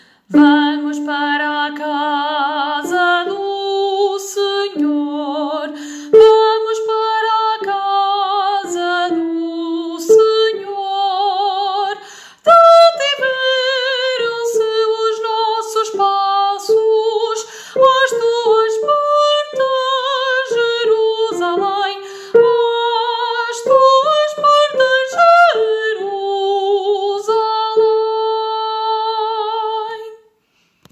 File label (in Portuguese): Entrada Estrofe1 Alto